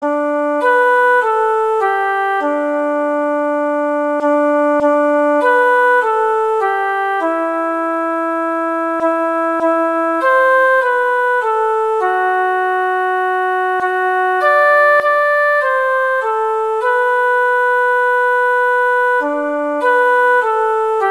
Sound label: Jingle Bells, Christmas; Christmas Beginners